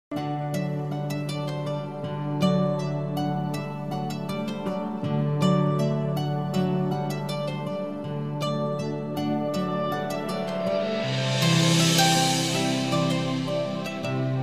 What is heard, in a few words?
drama ost ringtone; bay lagaam drama